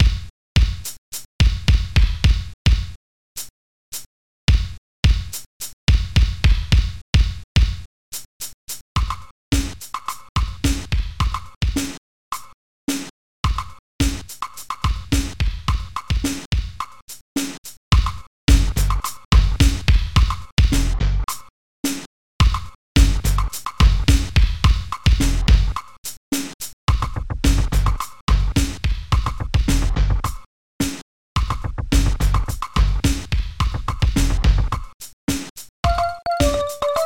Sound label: the beat